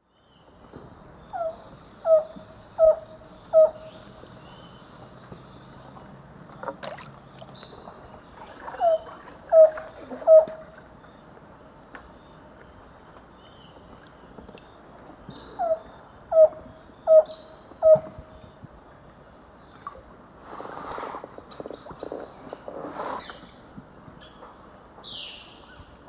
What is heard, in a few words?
Hylopezus fulviventris, song; S. America; Eastern Lowlands